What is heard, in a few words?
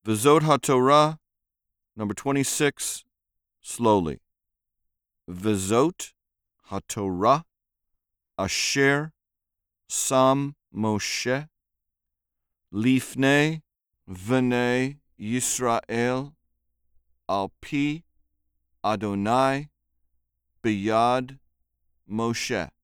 V'zot HaTorah slow; Learn the Prayers